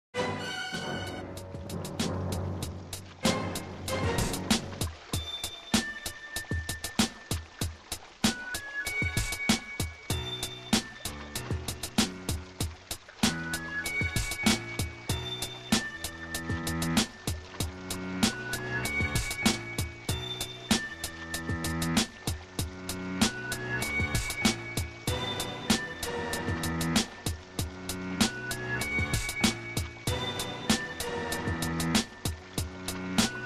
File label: Instrumental